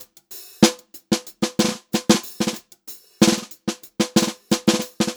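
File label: groovy variation, Funk-Groove Drumloops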